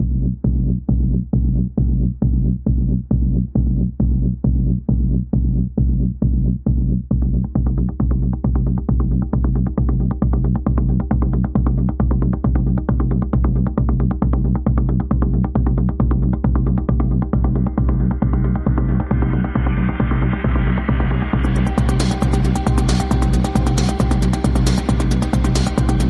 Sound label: techno